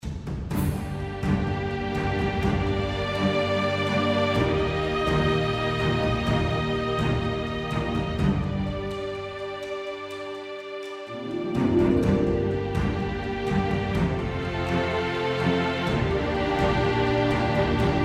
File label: music tension